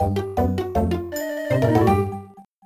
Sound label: Failed Sentry Duty; pokemon mystery dungeon explorers of sky